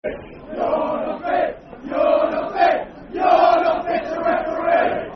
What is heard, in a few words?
You're Not Fit To Referee football chant (soccer song); Brentford